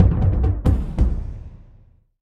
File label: Dungeon Name Stinger